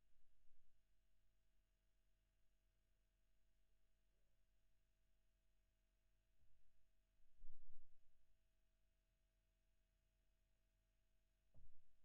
E.GTR Gefell OD

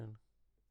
Classic Guitar

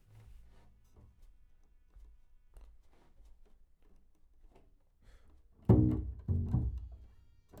Bass

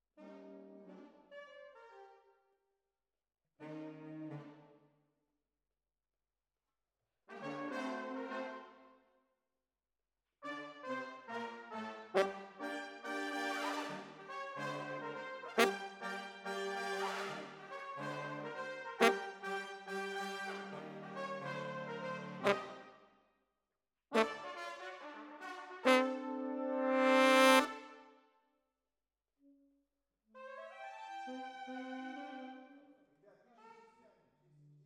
Horns

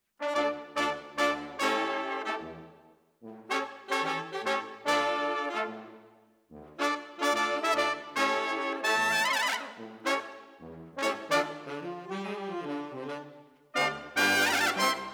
Brass Over

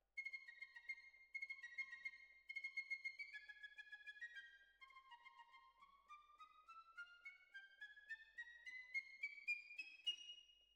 Violas Over

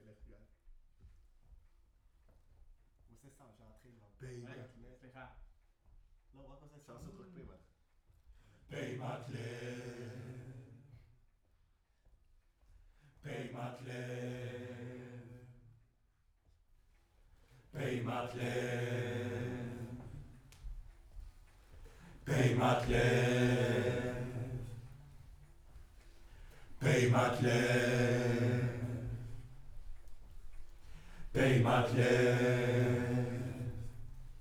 Choir Far

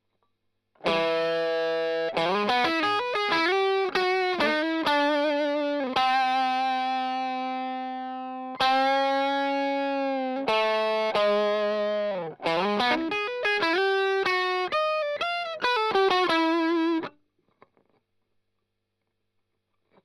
Strum Line

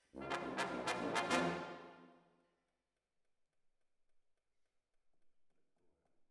ORTF, Jazz for piano

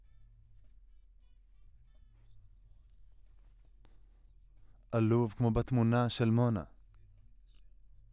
Voc OD

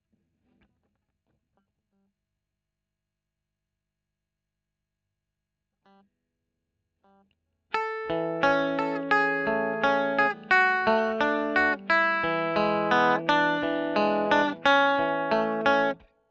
E. GTR Royer

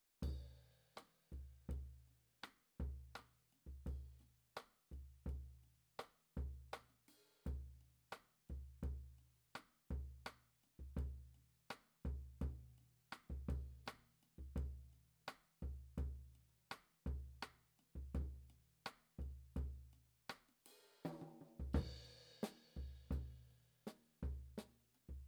KIT

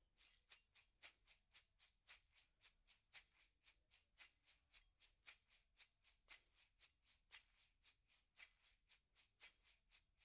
PERC DYN

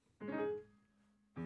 Voc

